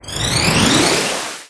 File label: Btl Actlrng Aura; The Denpa Men; Android